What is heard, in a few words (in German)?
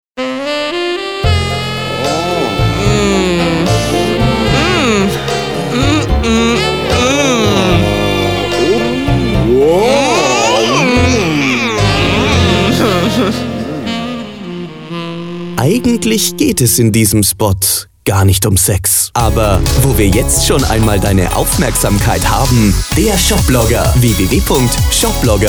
Jingle, Spot; Shopblogger